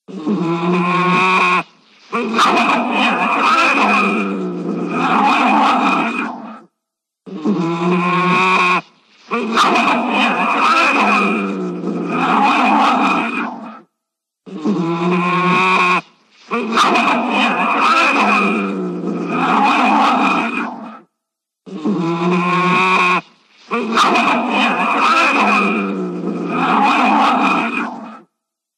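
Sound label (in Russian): barsuk golos, badger voice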